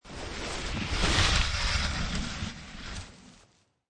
Skiing Snowboarding Downhill Slide By Slower

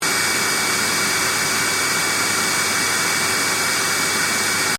Vacuum Cleaner Operating Loop; Dyson; Household Appliances; Appliance Sound Effects; Appliances (Sound Effects:Household)

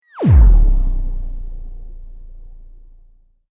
Sweep Mechanical Medium

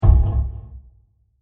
Massive Stab; Low Impacts

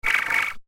Gritty Alien Liquid Discharge; Laser Sound Sound Effects; science fiction sound effect

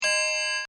Clock Mantle, Half Hour; Clocks (Sound Effects:Machines)

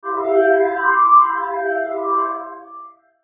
Sweeper Vox Pad; Sweeper Vox Pad Heavy Flange; Production Element Imaging Element Accent Transition; Sweeper Sound Effects

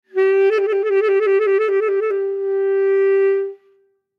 Tenor Saxophone G Trill; Brass; Musical; Blastwave

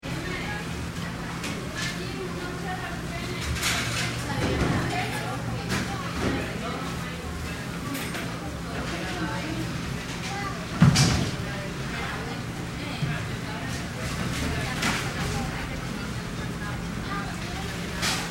Crowd Restaurant Costa Rica Active; Fast Food Restaurant; Restaurants (Sound Effects:Backgrounds); Big Room Sound

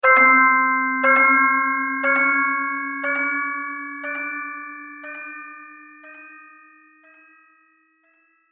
Ta-Da Chimes With Delay; Cartoon Music

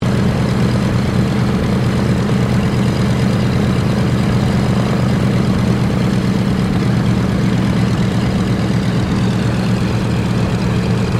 AIRCRAFT DOUGLAS DC3 IDLE; Qantas 1942 DC3; Airplane; Airplanes; Vehicles

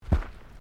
Footsteps Boots Walking Track Sandy Step

